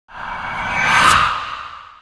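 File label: Ghost Attack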